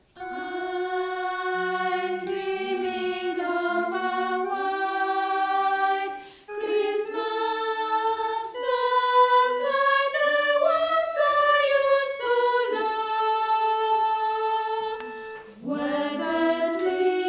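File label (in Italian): soprani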